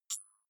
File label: click rough, signale